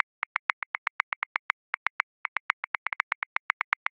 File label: Toms Pop, Grooves; Drum Booth; Ableton